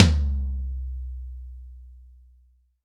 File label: long tom sample d key